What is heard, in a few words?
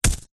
horror yeti monster footstep single heavy; zapsplat